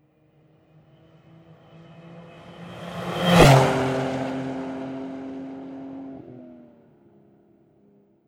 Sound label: porsche passing quickly